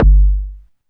bassdrums, techno; single-sounds; single-drums

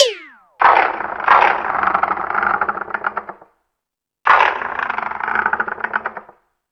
FX Sounds, Drum Machines

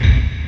LARGE BD, Industrial; BD KIT; Industrial Loops

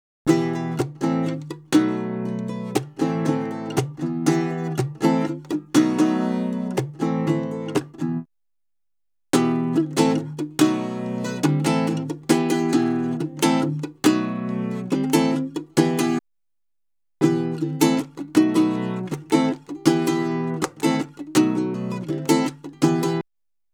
Salsa Picante; Masterbits; World Zone VOL-3

